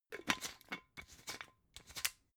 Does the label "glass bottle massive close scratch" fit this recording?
Yes